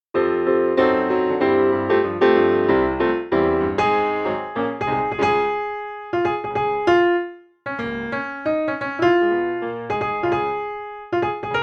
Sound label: Melody; Tiana; Mickey Wndr Book